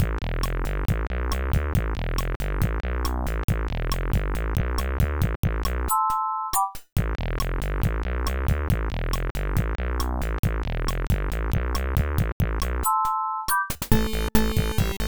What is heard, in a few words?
Rock; Leisure Suit Larry 5: Passionate Patti Does a Little Undercover Work; IBM PC AT